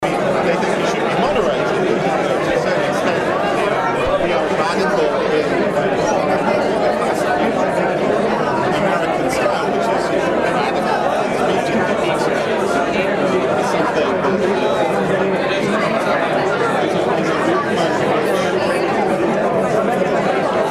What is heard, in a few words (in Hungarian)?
konferenciazaj; olympus ls10es diktafon